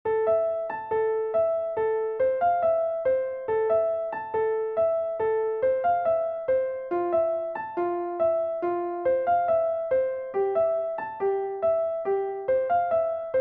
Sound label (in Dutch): sopraan; Minecraft; Blokfluitles in Utrecht